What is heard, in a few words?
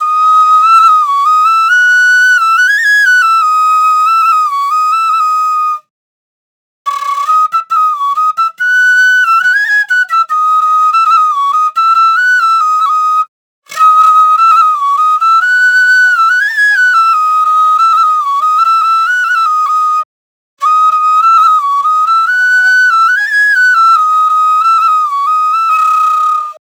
Trap Flute Loop BPM 140 B